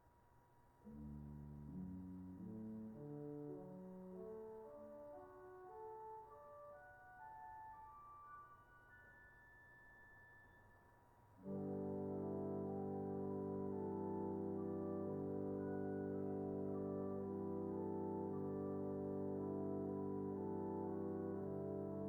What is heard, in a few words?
choir dulciana, organ; 8’ Dulciana; The true English Dulciana is a diminutive Diapason, smaller in scale, softer